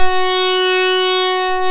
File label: ARP Synths, LFO SYNC D2; E-MU Formula 4000 Series Vol. 3; Analog Odyssey